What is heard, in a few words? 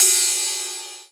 Rides, Cymbals